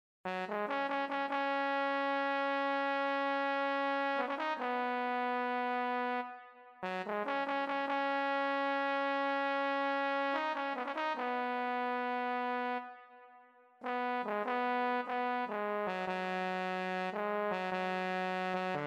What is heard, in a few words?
euphonium